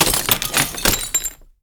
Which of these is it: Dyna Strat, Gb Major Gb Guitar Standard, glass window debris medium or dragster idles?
glass window debris medium